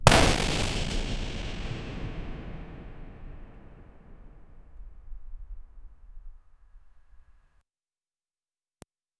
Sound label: a dynamite blast in a mine followed by echoing debris